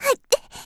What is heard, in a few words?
attack; Eternal Return; Tia; Voice Line; KOR